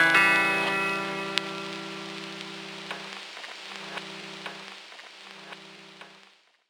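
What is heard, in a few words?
guitar lost; Rawcutz; Nu Forms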